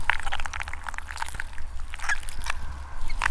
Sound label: Flesh Move Slow; Puddle Plus